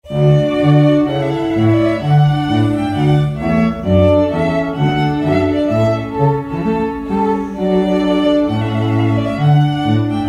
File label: Hornpipe; Handel